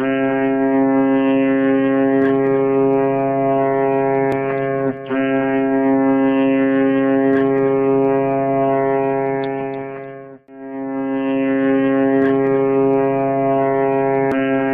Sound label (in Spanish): sonido bocina de un barco